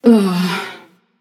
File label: ugh passion; chaac